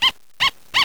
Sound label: bark, sugar glider